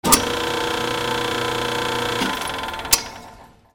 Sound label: INDUSTRY